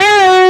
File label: bend full release; osu